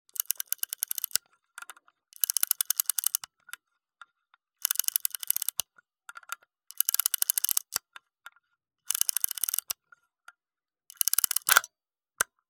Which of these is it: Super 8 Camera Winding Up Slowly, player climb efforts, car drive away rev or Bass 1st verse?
Super 8 Camera Winding Up Slowly